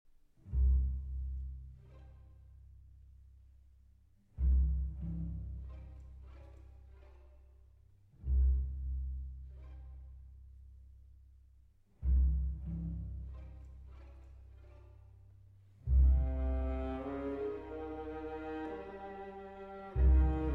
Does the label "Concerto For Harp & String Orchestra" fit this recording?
Yes